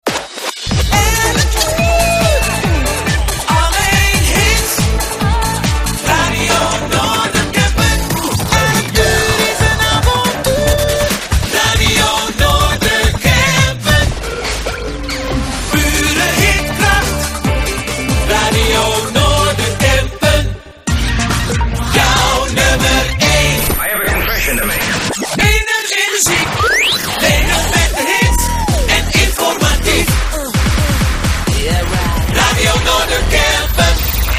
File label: Jingles